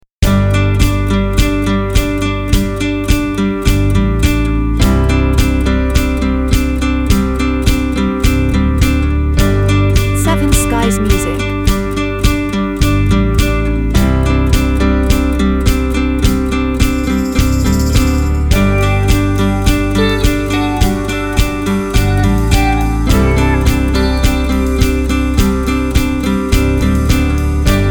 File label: Acoustic